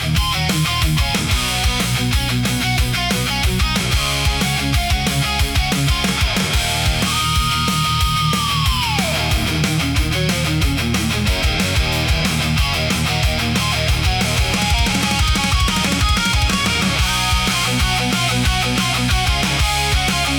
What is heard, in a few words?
hard rock for powerful